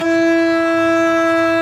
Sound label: E4 ARCO BA